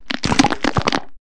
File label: objects, World of Tanks